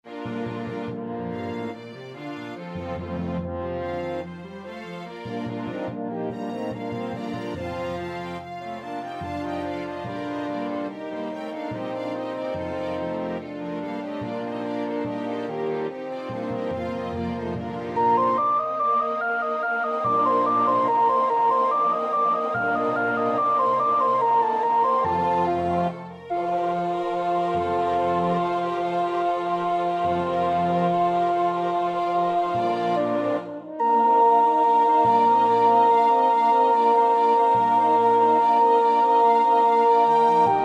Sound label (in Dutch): sopraan, koorpartij oefening; Bach (Johann Sebastiaan); BWV 143